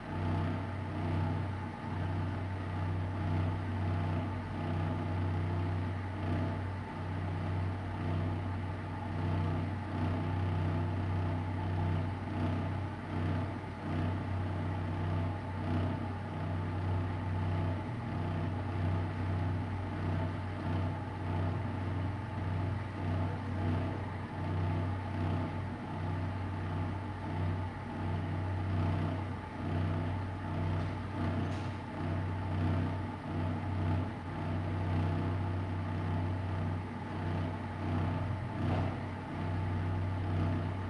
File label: room tone empty school toilet medium scary dark pulsating ventilation; sonniss gdc